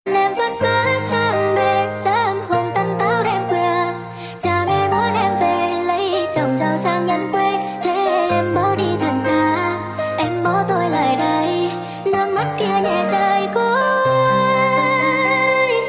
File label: speed up piano